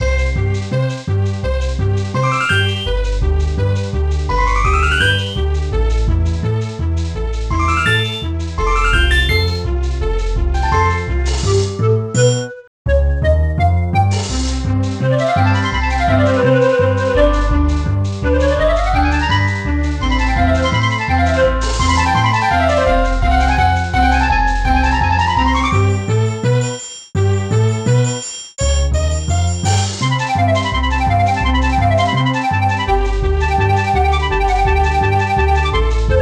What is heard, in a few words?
Busy Loop